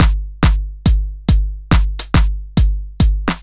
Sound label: Groove Pizza 70bpm